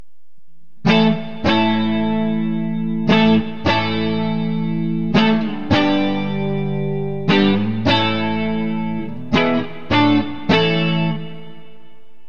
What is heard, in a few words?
clean; neck; Burny